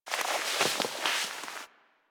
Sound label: Classroom Move Foley; Ambience; Warframe; The New War; Quests; Zariman